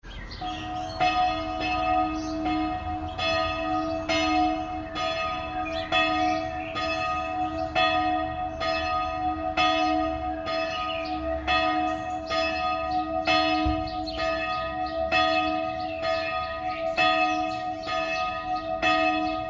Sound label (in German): kleinste Glocke